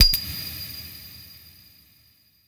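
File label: Design misc Kill Confirm Metallic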